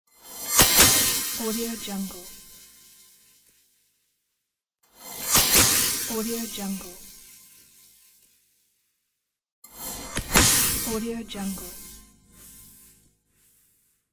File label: electric blast; volkovsound